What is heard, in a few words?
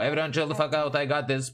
chillout